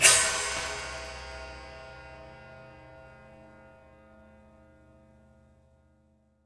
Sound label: Prepared Drums